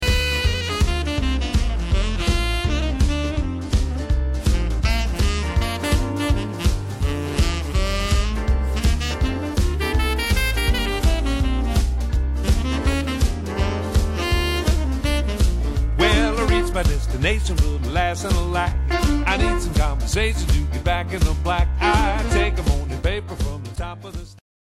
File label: countryswing